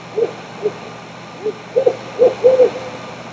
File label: owl hoot